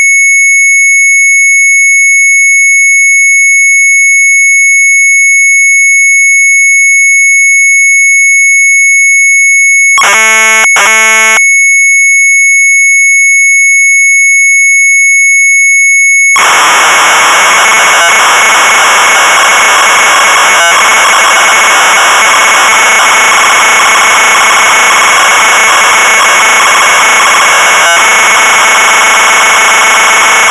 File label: MZ800 Tape; BIG BEN; retromelsarchive; sharp mz800